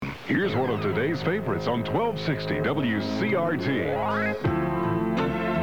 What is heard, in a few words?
jingle; wcrt